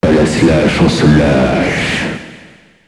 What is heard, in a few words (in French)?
JINGLE; on se lache